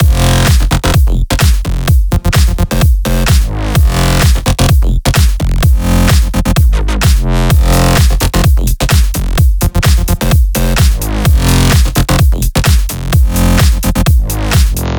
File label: Cutter Mix, 128BPM; Vengeance Samples; Cutter Kit